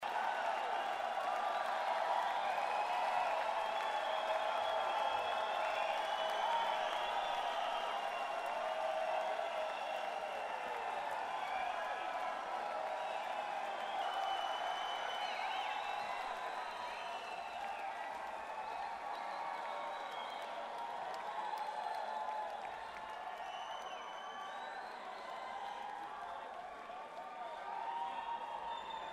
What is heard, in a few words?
Crowd; Rochester, MI - Meadow Brook Music Theater